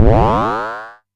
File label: Amdek Perc